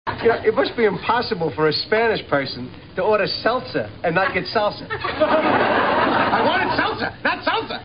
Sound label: salsa